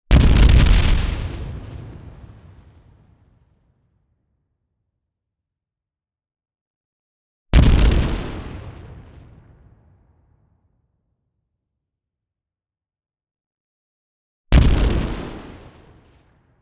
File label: Classic Explosion Designed